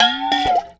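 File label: WASHEE BEL, percussion metallic; Rarefaction; Poke in The Ear With A Sharp Stick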